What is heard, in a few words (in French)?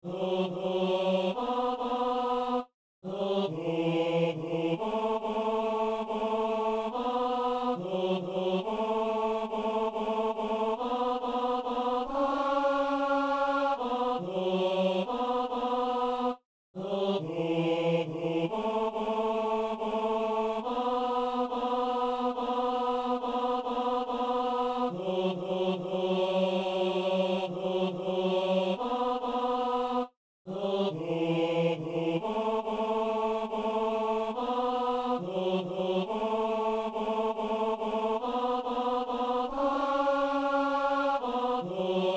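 ténor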